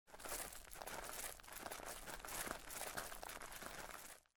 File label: Footsteps; Age of Empires III; cinematics